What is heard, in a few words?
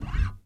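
SB SCRAPE, FUNK BASS; Northstar - New Gold VOL-1; SPEC.FUNKBAS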